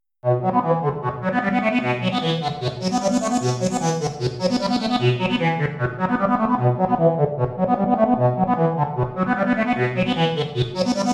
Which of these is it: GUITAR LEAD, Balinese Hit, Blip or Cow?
Blip